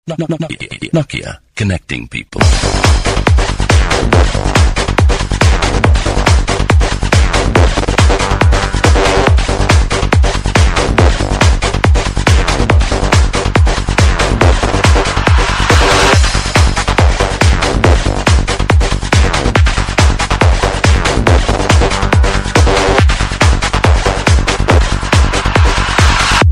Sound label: Psytrance; Nokia